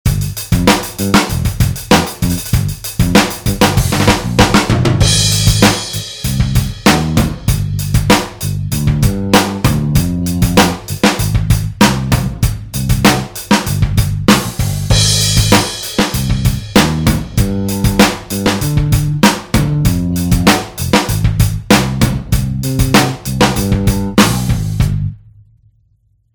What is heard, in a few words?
funk